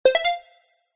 multimedia game sound synth digital three tone beep